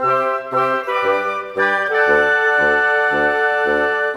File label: Winds, Orchestral, Rock Pop; Sonic Foundry (Sony Creative Software); Orchestral Series VOL-4; Rock and Pop; Rock-Pop 03